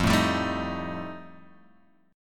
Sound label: F 9th F Guitar Open G, chord